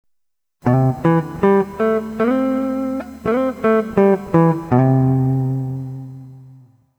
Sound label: Country scale; Blues e improvvisazione